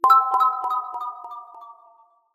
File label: Vivo T1 5G Sms Ringtone